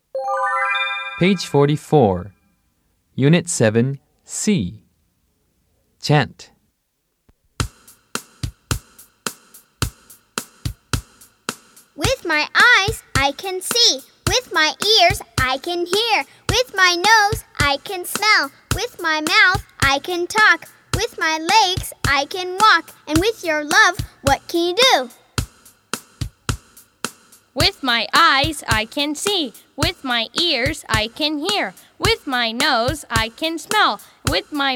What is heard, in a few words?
Chant